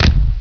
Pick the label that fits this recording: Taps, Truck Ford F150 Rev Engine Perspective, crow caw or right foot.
right foot